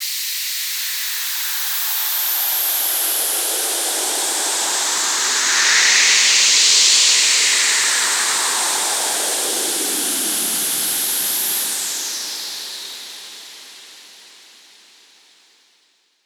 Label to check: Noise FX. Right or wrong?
Right